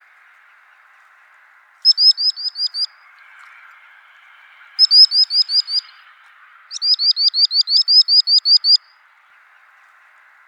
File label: Common Sandpiper